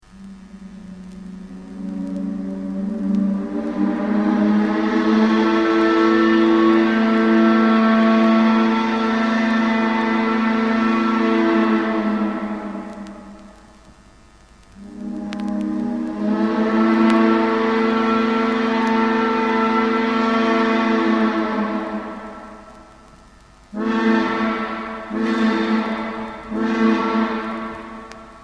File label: aliquippa, steamboats